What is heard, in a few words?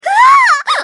death; csgo